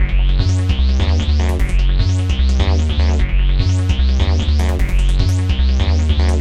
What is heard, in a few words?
Bass Loop